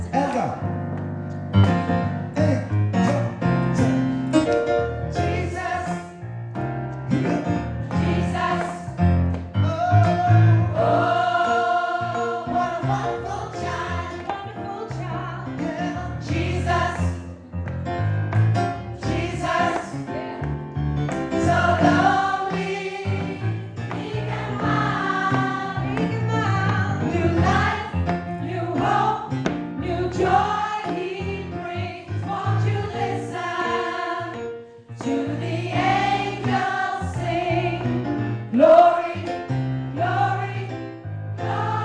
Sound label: jesus what a wonderful child alt